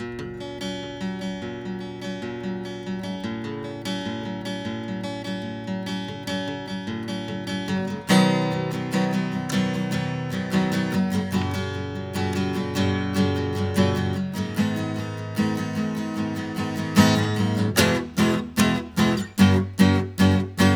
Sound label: Ac Gtr API